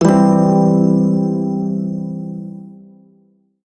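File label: Shawzin Pentatonic Major Chord; Warframe; Prime Shawzin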